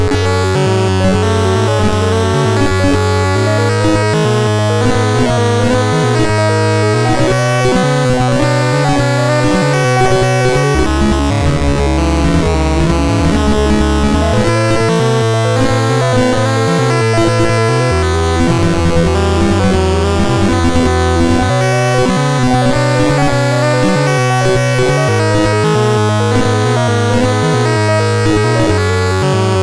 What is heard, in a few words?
Randomly generated music BASIC, sid MOS6581R2